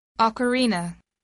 ocarina

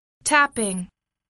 tapping

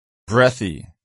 breathy

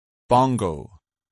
bongo

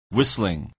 whistling

